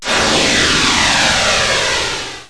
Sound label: missile